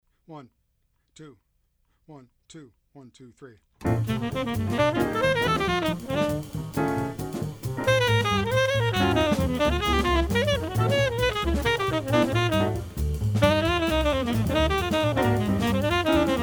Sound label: Tenor fast version